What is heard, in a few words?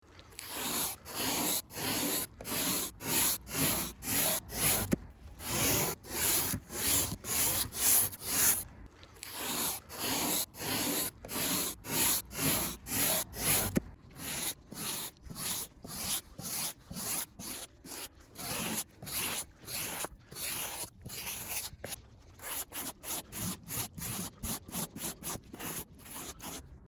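scraper on car sped up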